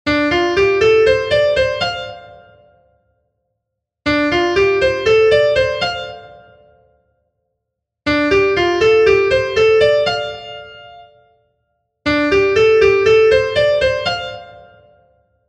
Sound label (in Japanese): D マイナーペンタ ツーファイブワン の ワン